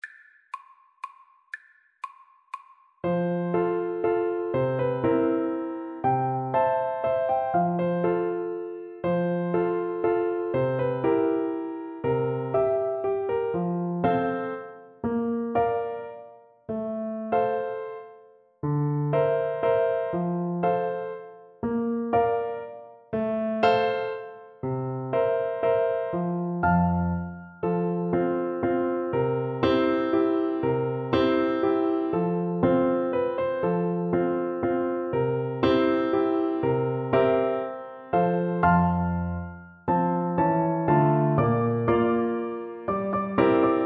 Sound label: bella bimba BN, Bassoon